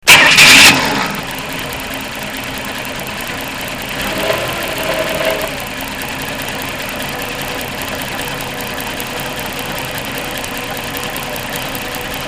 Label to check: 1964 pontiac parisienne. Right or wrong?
Right